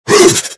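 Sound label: lizard attack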